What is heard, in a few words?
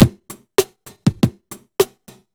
hiphop, Akai MPC2500, drum machines